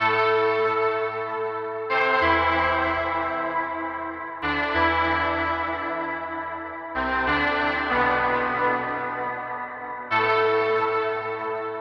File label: 95 BPM A Min Mellotron Strings; Stems; Cymatics; Dystopia; MTRON+OB6